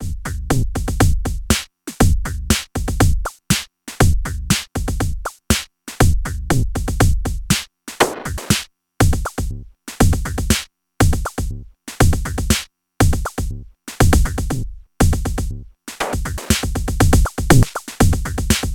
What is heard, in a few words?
Future Retro Transient Plus RING Mod by OSC1 CV Pattern